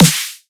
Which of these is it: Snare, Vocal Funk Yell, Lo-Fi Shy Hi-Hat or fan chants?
Snare